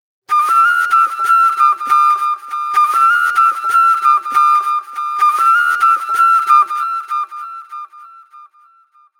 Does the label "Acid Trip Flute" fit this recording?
Yes